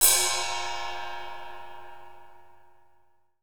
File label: Kit Heavy ride; CMI